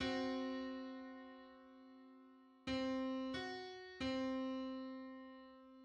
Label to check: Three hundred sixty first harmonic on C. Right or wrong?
Right